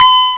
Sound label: STRA BELL, Hard & Loud Techno; USB Soundscan vol.01; AKAI